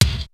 XR-10 K+SN+H, drums; AKAI; Rubber Chicken Software Sample Bank; DRUMS + PERC

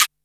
XV Perc, Roland XV-5080

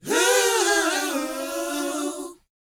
LET, Vocal, R&B Dance, DANCE 120

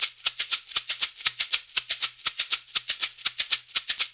LOOP, Percussion Slam; GRUNT; InVision Interactive

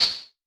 HH, Vermona Mono15 Drums

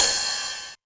909 Ride, Drum Machines; Novation DrumstationV1

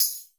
Dark Tamb, Alesis D4 Drum Machines

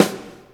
AMB POP SNARE; InVision Interactive; Lightware VOL-1

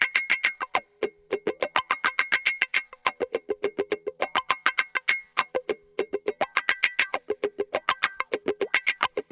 103 FUNK GTR WAH; 103 BPM FUNK B; Drumdrops; Compact Disco

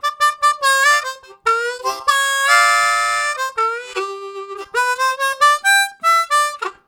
Cautious, Harmonica Riffs; Zero G

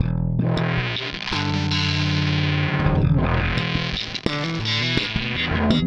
Delay Distort, Bass; Bass X

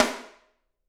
SD, Drums; Bob Clearmountain Drums I

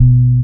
Elc Bass; Equipped Music

